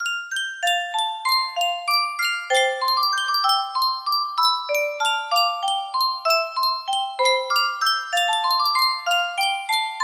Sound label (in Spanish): Yunsheng caja de musica, music box melody